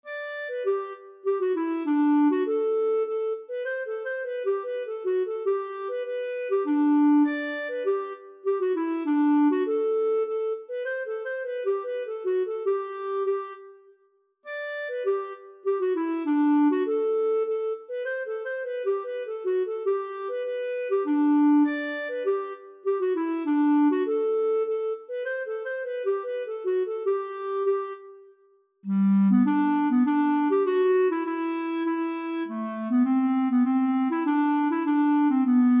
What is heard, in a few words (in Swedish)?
pols; Flottarpols